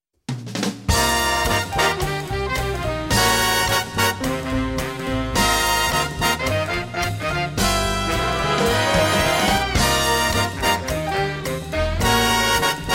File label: Kicks and Sticks